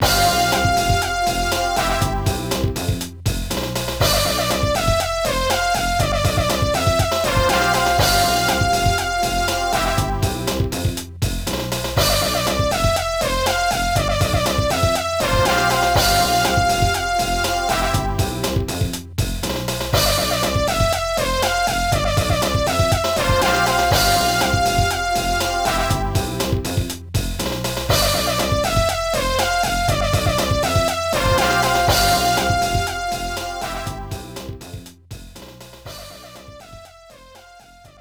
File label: Match Won; Super Sidekicks 2 The World Championship; Neo Geo arcade